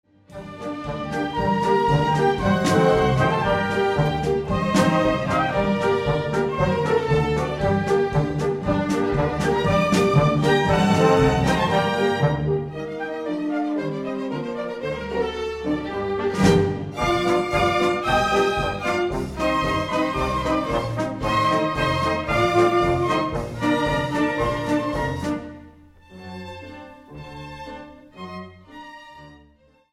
Hungarian Dance, instrumental classique

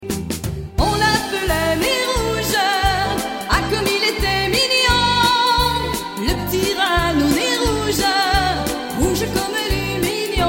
X-mas Tones

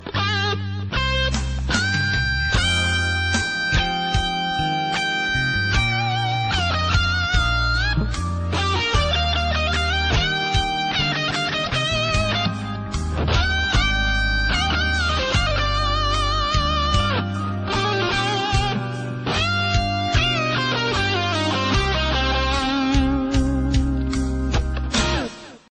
Guitar

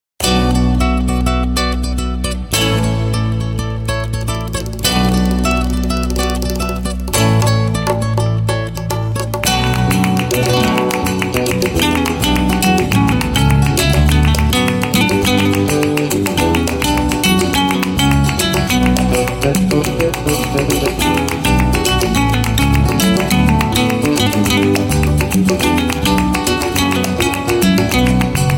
Guitar

